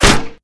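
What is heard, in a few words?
metal hit; mod slipgate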